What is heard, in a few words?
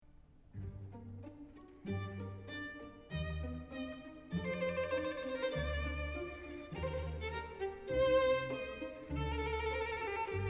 violin concerto Andante